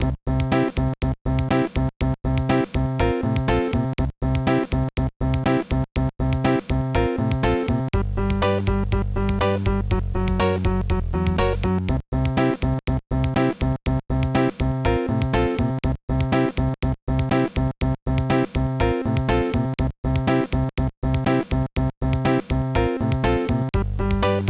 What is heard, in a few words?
BGM; Matantei Loki Ragnarok Gensou no Labyrinth; gba